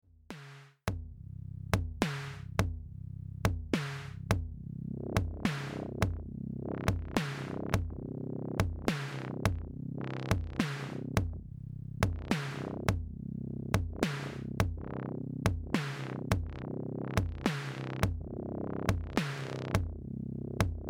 tempest owncutoff

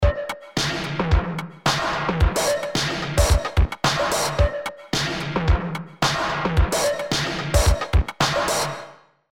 Drum Broken HP; Arturia CMI V; Dist OPAMP 21

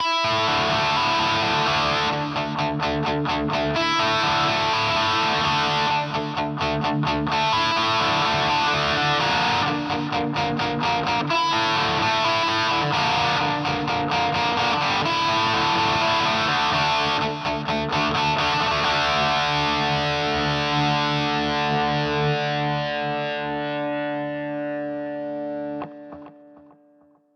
Harley Benton DNAfx GiT Amp Plexi 100

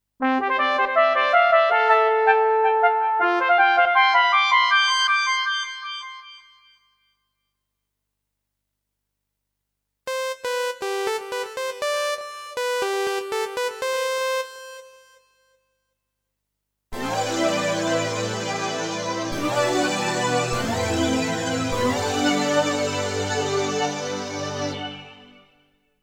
Thomann AK X1100 Synthesizer

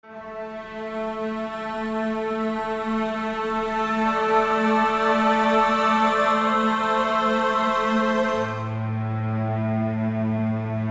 Traditional Sustains

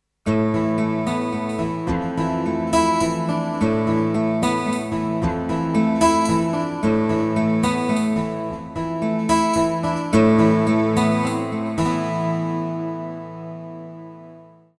equinox 12String

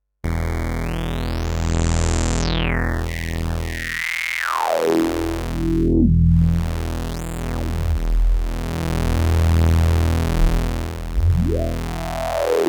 Notch Filter

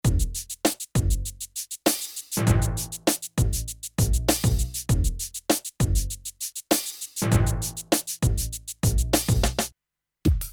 proteus 2000 composer drumkits

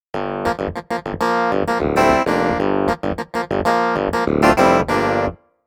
Roland Clav

